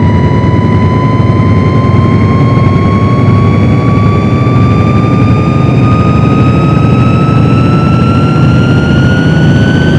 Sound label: ghost crush